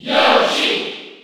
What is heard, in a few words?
Cheer French; Yoshi; SSB4